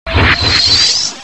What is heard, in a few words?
laser charging